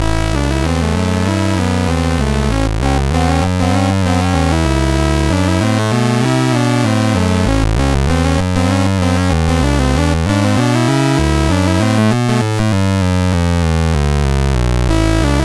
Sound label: Computer Blues, MOS6581R3